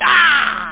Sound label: aaargh!; conquest